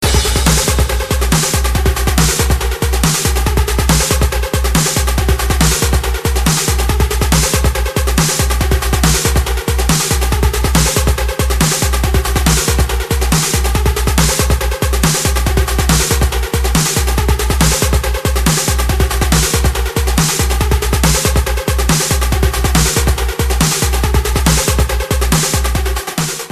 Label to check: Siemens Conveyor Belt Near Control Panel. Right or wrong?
Wrong